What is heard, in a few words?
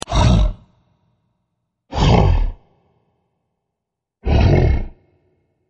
grunting allosaurus